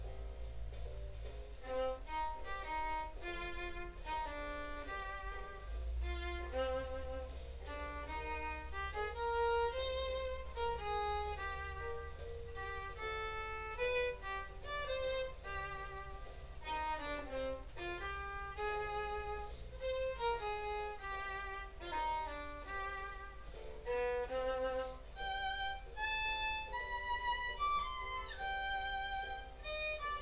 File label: jazz, violon